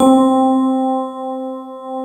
warm tines; DCS KEYS

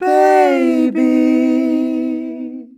BABY, males; Best Service; Hyper Dance

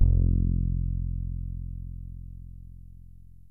FRETLESS, BASSES; Kid Nepro; Elite

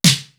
SNAP SNARE; East West; Global Sounds

